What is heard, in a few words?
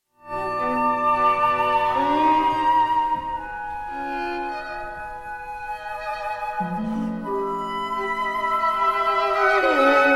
violin concerto; alban berg